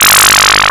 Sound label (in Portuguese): arma laser-zapping